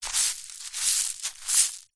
foley footsteps barefoot scuffs movements small stones shingle